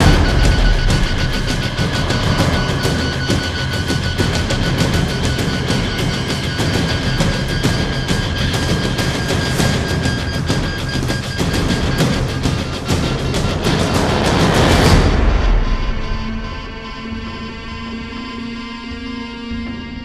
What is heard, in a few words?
OUTRO INTENSE